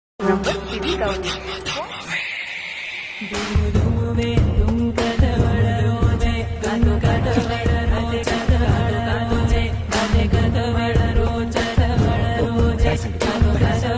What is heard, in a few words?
villain bgm; magadheera